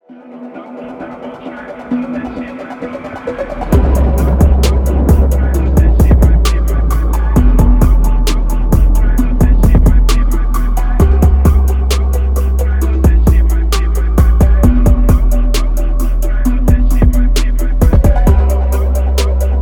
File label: phonk remix